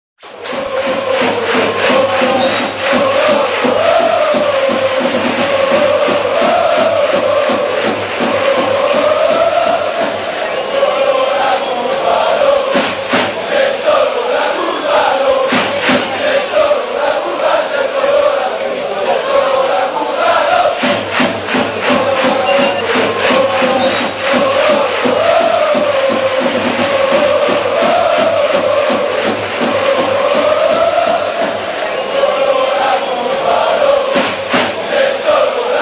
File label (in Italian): cori; 1981lecce; solo la nord